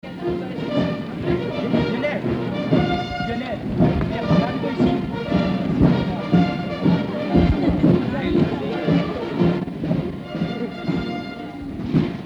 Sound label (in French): Fanfare; 1960 Jubile